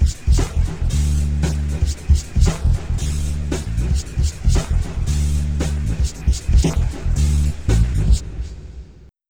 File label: Ditty; NBA Courtside 2002